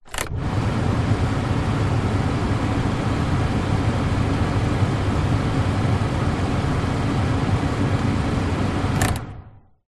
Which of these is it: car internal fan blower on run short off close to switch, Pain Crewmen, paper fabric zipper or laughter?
car internal fan blower on run short off close to switch